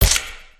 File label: brick pickup, ui; aronwk collection; ndaudio